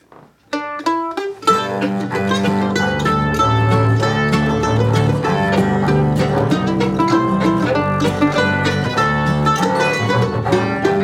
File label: Four Wet Pigs Key G